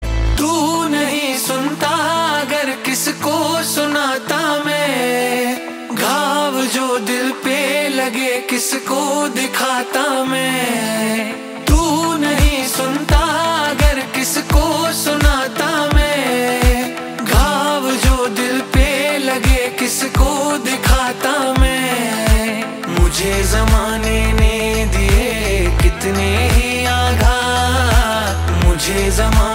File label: Ringtone